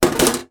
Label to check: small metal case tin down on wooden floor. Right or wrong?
Right